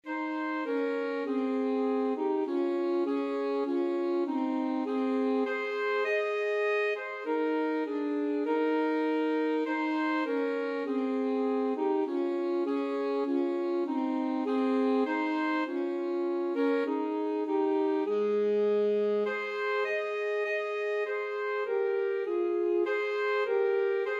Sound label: alto saxophone duet